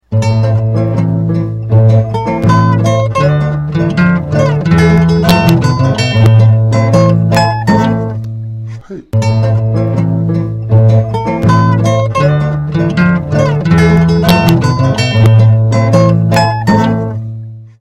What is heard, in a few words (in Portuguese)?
samba